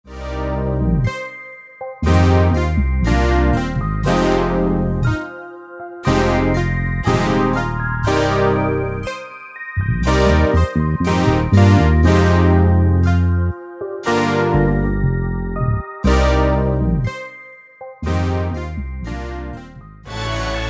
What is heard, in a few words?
exquisit synth